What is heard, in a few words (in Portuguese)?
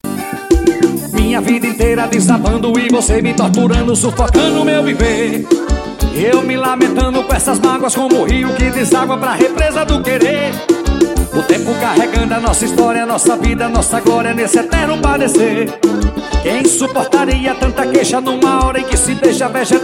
forró